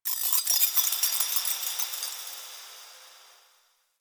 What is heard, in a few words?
Moon Chimes Small E, Ambience; Warframe; Orokin